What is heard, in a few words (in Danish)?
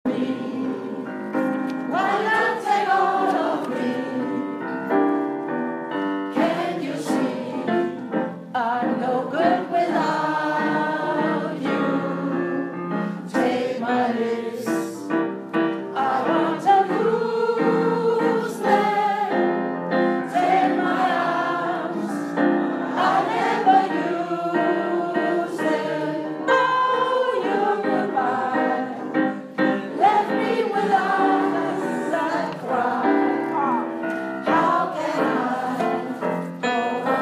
melodistemme